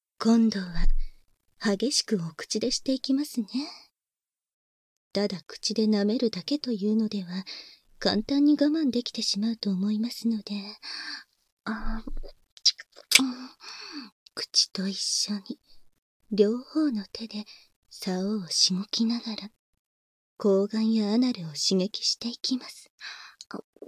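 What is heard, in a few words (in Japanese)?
フェラ（ハード）（バイノーラルマイク収録 旧効果音あり）; 旧効果音（前作品で使われていたもの）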